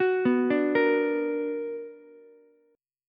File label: B Minor Major 7th, Ukulele; arpeggiated; JGuitar